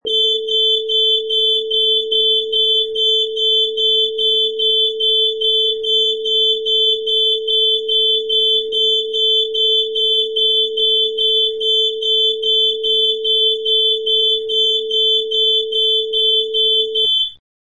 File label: Fire Alarm